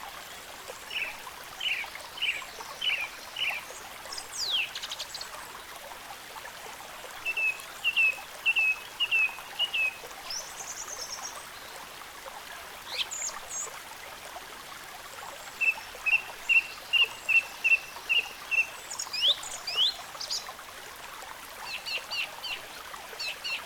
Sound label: forest